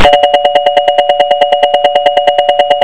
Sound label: TELEPHON